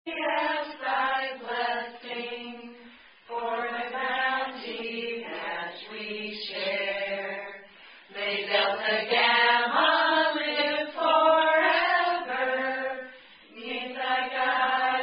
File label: Sorority Song; Delta Gamma Grace